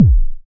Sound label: BD ANALOG; Sound & Vision - Gigapack II CD 2